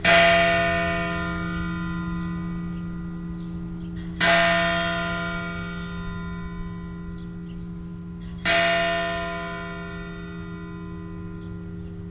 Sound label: clock